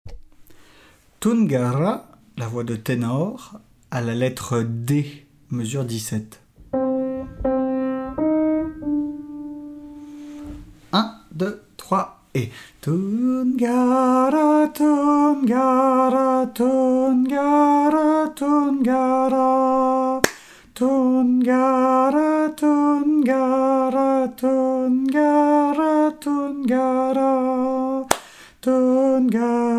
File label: tenor